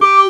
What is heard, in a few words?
talk box boom